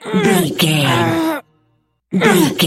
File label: voice female effort push